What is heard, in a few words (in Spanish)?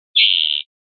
rep ranadas, pardillo